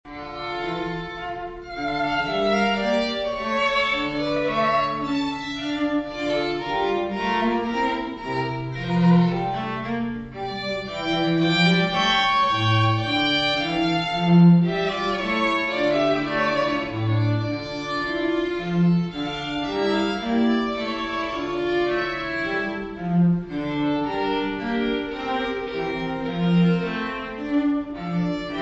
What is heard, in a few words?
andante, string ensemble; Handel; Water Music